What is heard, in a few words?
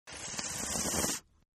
Slurp Female, Drinking; Sound Effects:Human; Partners In Rhyme